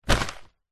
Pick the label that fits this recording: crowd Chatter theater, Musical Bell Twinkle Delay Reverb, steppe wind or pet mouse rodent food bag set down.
pet mouse rodent food bag set down